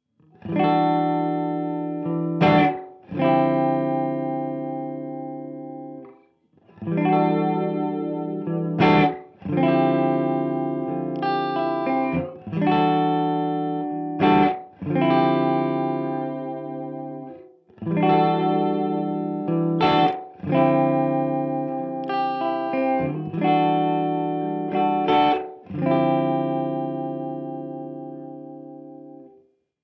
Beetronics Seabee Chorus

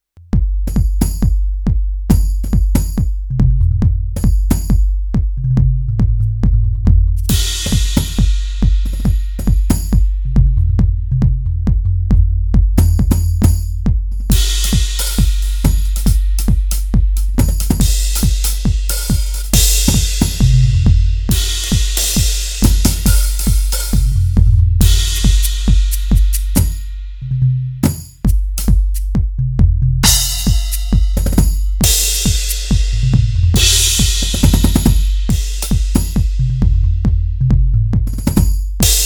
gewa G5 Modul Udu Sounds

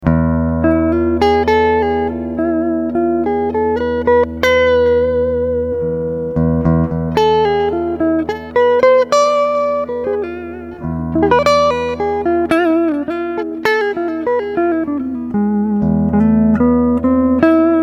Clean melodie 2 Humbucker

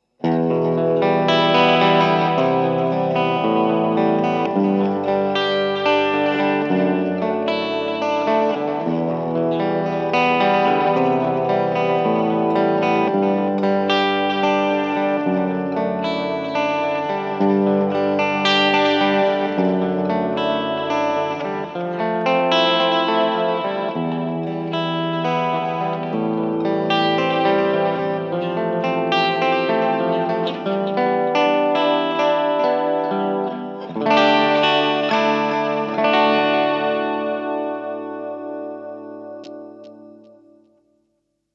Clean

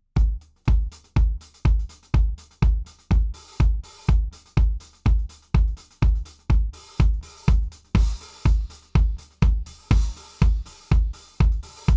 Yamaha EAD10 Dance Kick

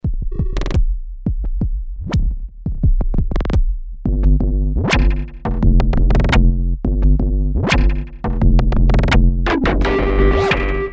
Boomboom

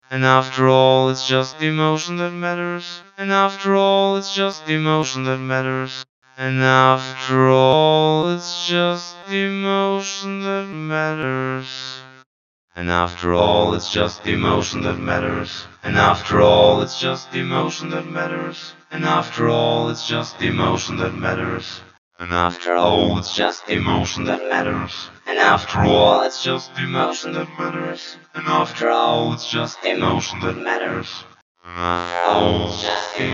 wave

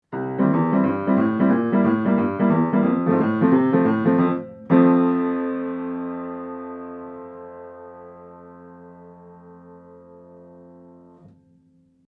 ELAM 251 OMNI Piano 2 Meters

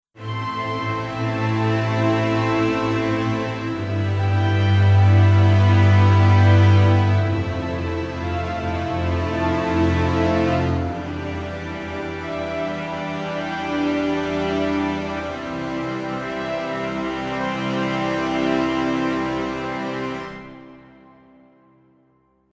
Winter Strings Tragic; TO Animated Orchestra; Millennium

